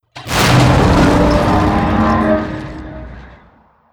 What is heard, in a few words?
structural collapse; Stellaris